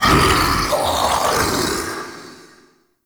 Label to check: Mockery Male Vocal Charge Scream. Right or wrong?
Right